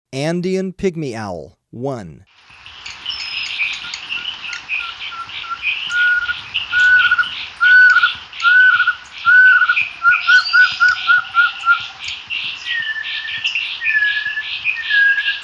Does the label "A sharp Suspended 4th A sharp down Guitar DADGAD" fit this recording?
No